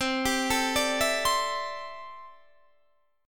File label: C 6th Add 9th C down Guitar DADGAD